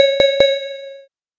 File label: ding; linux-virtual-jay-peak; soulride